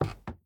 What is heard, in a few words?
Wood soft; Devour